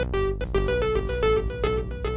silly synth echo melody, synth and electronic loops